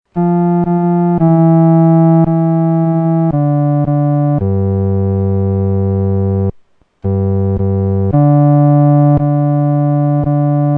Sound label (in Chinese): 独奏 第四声